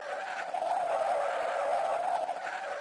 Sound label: car skid loop slow; lightning speed